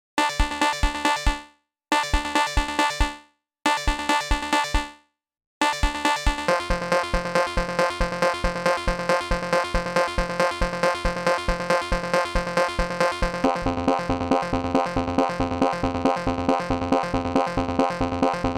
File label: Background Line; Noir